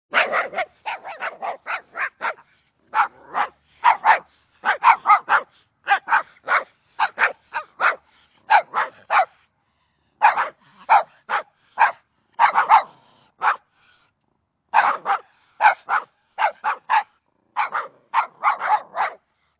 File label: Small dogs barking